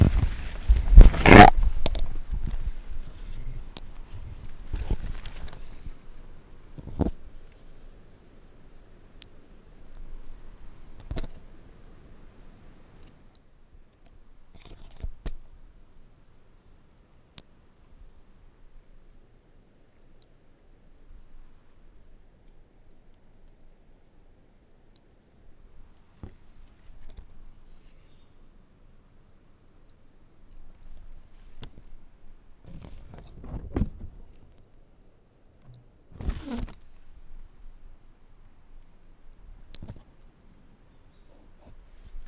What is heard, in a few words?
Brachycephalus mirissimus individual 10-30 cm initial half missing